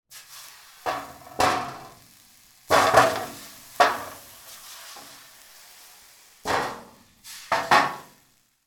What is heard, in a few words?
water sizzle on hot metal plate bend warp; sound effects one